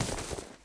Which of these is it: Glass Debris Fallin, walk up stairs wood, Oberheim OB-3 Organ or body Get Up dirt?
body Get Up dirt